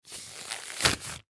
Latex Glove, Latex Glove Rubber Take Off; Surgeon Surgery Doctor Nurse Physician; Hospital; Emergency

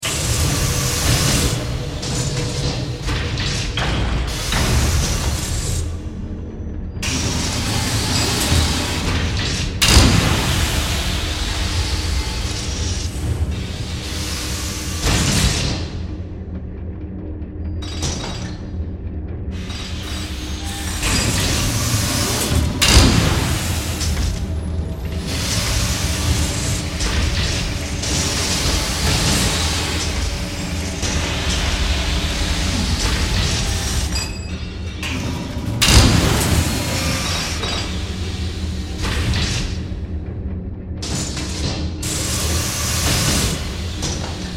Factory Machines

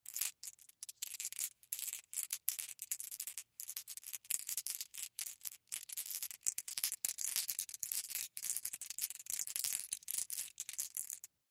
Ball Bearing Multiple Hand Roll; Ball Bearing Multiple Hand Long; Rolling Sound Effects; Foley; Blastwave

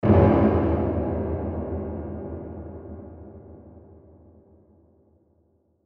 Hit Hollow Dark Reverb; Blastwave

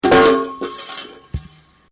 METAL POLES CRASH; Metal (Sound Effects:Materials)

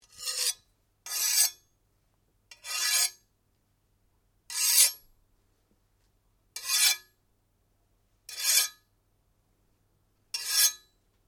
Blade Scrape Sequence, Sword

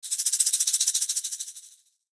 Musical Shaker, Musical Shaker Rattle Long; Blastwave; Sound Effects:Musical

